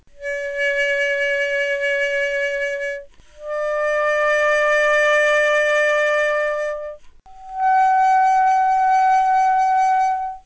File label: saxophone; Spectrum Interpolation Synthesis for the Compression of Musical Signals